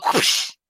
whip

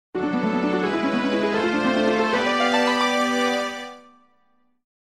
ocarina; ocarina of time; enter zelda

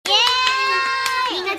yaaaaay

